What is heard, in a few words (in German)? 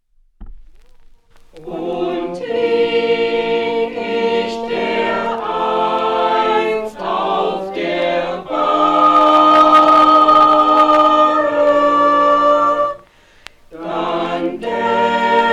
hymne